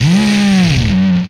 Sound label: Rev, Retro Arcade Sounds, Vehicles